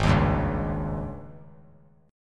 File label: Ab Major 7th Suspended 4th Ab down 3C 3F 3A 3D; strummed; JGuitar